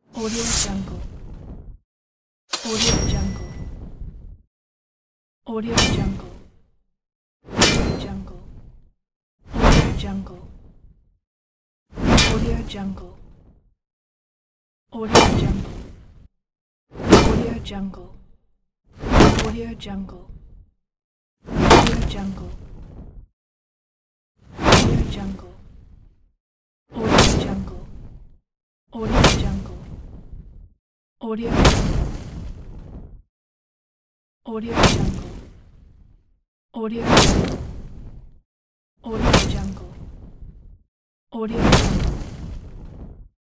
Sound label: fire sword gaming pack